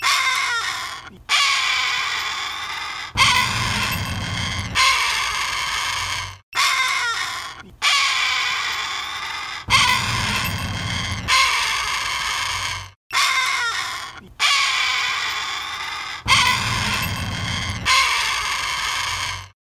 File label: ringtone